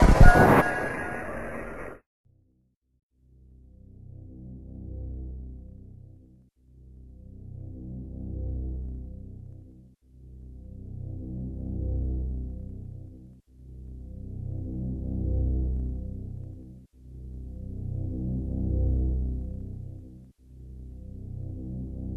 Samus Death, Metroid; Metroid (USA)

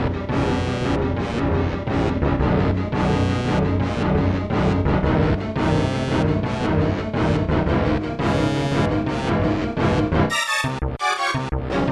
Boss Fight; Metroid (USA); Kraid

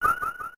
Gaining Energy; Metroid (USA)

